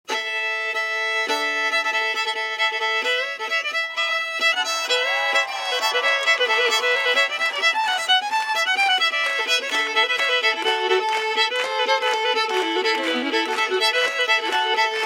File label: Guitar Boogie